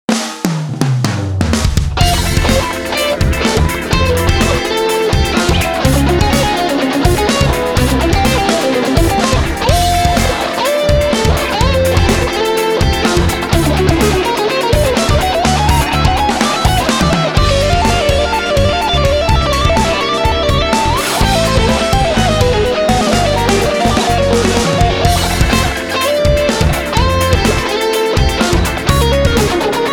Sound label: funk-fusion; Nu pogodi